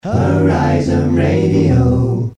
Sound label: Acapella, Radio; Horizon Radio